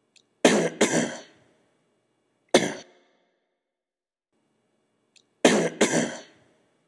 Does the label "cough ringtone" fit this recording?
Yes